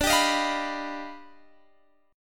Eb Augmented Major 9th D

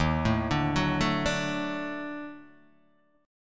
Eb Minor Major 7th Flat 5th, Guitar Open D

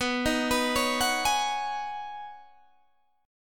B 6th Add 9th B Guitar DADGAD, chord

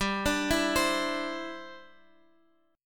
E Minor 7th Sharp 5th G

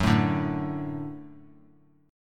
Gb Minor Sharp 5th Gb; strummed; JGuitar

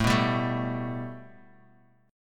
A 7th Suspended 2nd; A7sus2 strummed; JGuitar

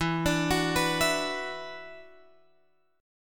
E 7th Suspended 2nd, down, Guitar, Drop D

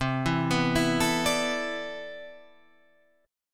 C Major 9th Add 13th, guitar chord

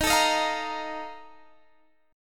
F 11th Eb, chord

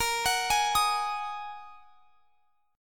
Bb 7th Suspended 4th Sharp 5th Bb down; Bb7sus4#5 arpeggiated

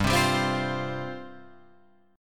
G 7th Suspended 4th G down Guitar Open F; Listen to G7sus4 strummed; G7sus4 Chord

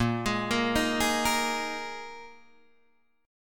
A sharp 7th Suspended 4th down Guitar DADGAD

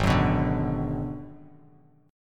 Ab Minor 7th Flat 5th, Bass Standard; strummed; JGuitar

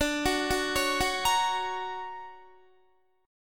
D Augmented Major 7th down Guitar; D+M7 arpeggiated; JGuitar

